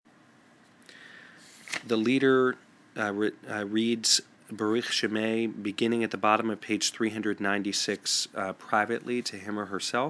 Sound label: torah service, shabbat tunes